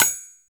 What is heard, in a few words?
cymbal drum; ETH. PERC; Drums In Your FACE!